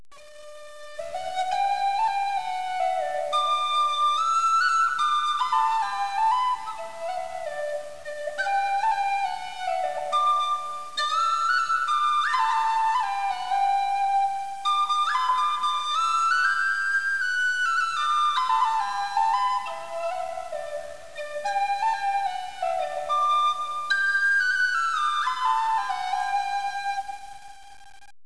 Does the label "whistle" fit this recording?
Yes